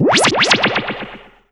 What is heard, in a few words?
SciFi; CarmenSandiego